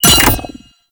grenade bounce; Warframe; Lasrian; Lasrian Soldiers; Weapons; Lasrian Goonadier Grenade

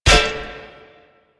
Glyph Puzzle Disc Land; Warframe; Duviri

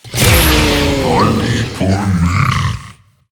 Death; Hard Drive

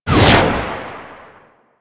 Jet Turbine Pistol Secondary Shot Release; Warframe; Tenno

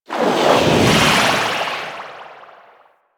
Crushing Water Cast; Warframe; Yareli

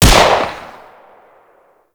Cowgirl Pistol 2D Shot B; Tenno

